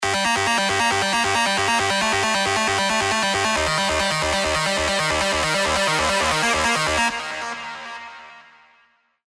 Only Synths, trance leads; spire; revealed spire trance leads vol 1

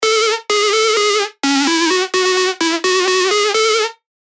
Trickster, dirty dutch leads; Spire; revealed spire dirty dutch leads vol 1

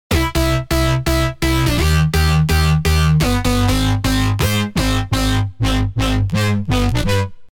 BS, bass; bass tech house; Flavor Bomb; revealed serum 2 bass tech house vol 1

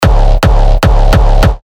Kick C#; Tartarus; Revealed Terra Kicks

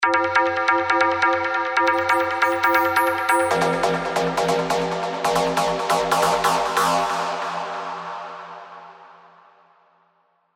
Stab; Bumerang; revealed spire mainstage drops

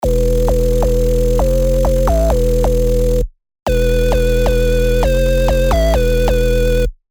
Glass Start Cry; revealed serum 2 hard bass meltdown

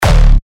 Kick D; revealed magma kicks; Flamethrow

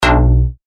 Bass Shots B; Shield; revealed bass shots vol 3

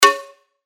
Pluck Shot C#; synth shots tech house; Luna; revealed

